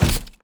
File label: fly endo walk; steps; the monster plane; kolmods